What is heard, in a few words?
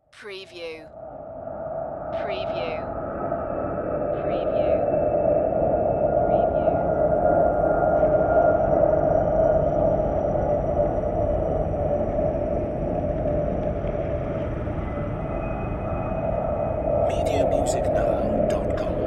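Production Element Bed Crystal; Sound Effects Bible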